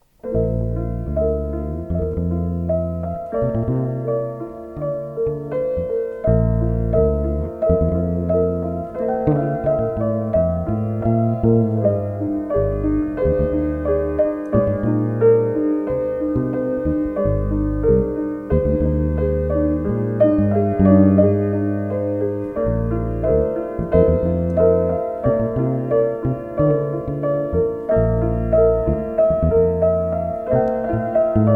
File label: Duet for piano and bass; Forest Retreats